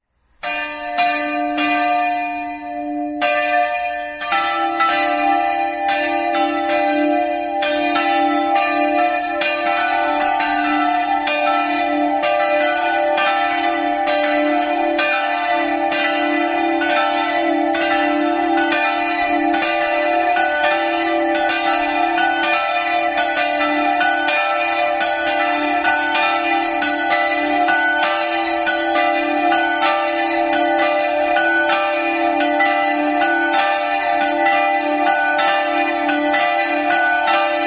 melarolo, campane

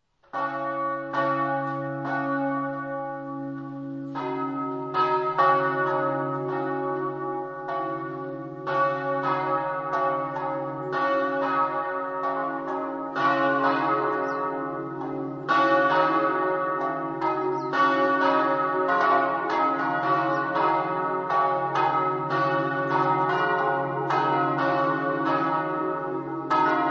fanna, campane

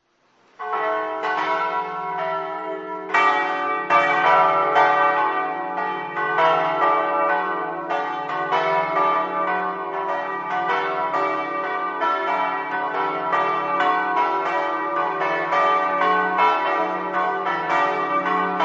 farra, campane

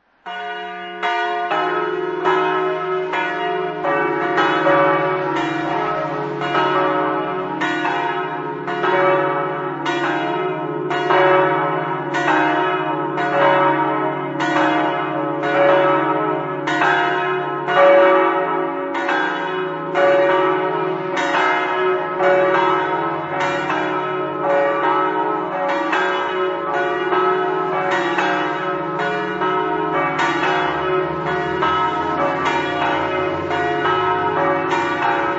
dignano, campane

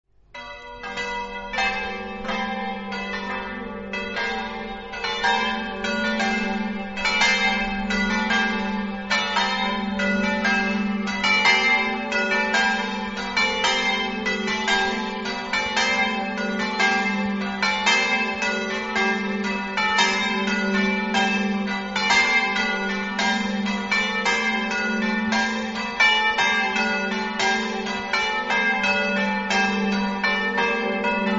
merlana, campane

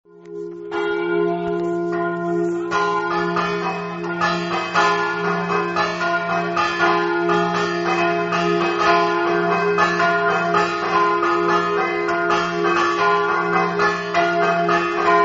ruttars, campane

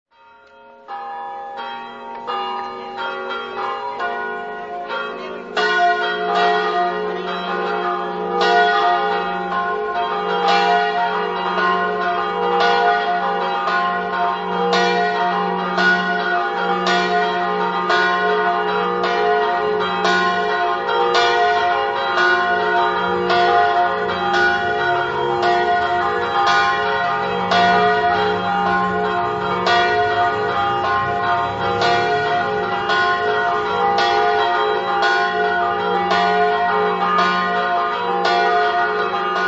dolegna, campane

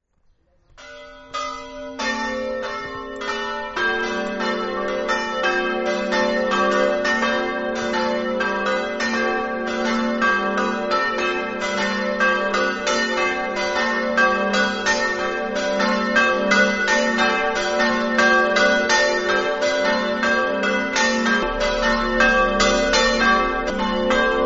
chiasottis, campane

